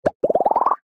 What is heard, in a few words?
Stylized Bubble Pops Ascending; SoundsCrate